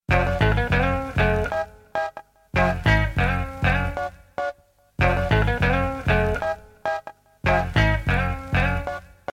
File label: Guitare Beat Sound